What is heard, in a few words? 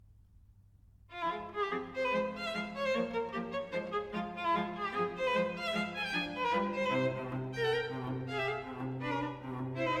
string trio